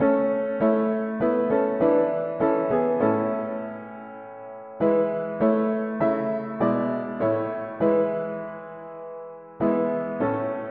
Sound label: mono piano, hymns; Thou holy One and true